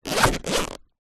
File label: foley small pouch bag rigid fabric zipper; zapsplat